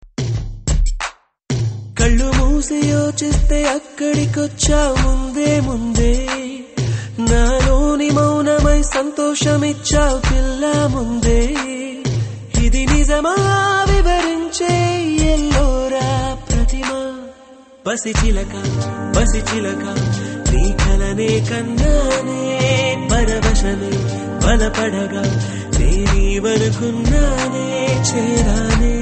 Telugu; Ayan

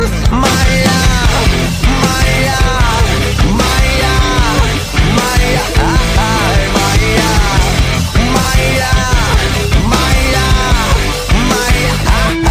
Crazy Loop

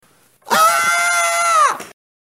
Scream; Trixie Mattel

